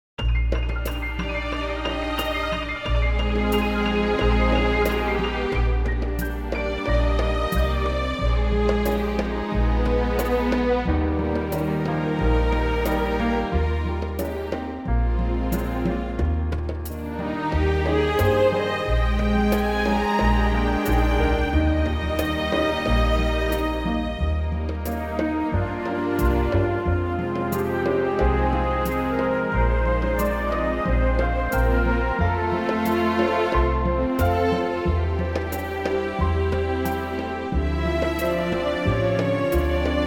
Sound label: orchestral Eb